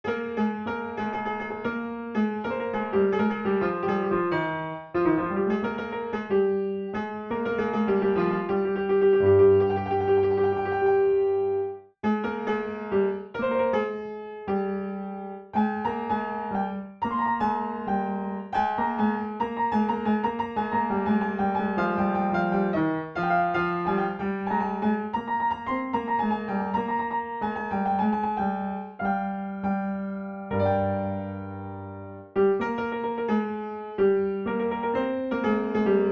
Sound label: Muyeh Segah, piano; Radif Mahjoubi